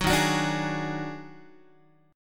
D sharp Minor Major 7th Double Flat 5th D sharp Guitar; strummed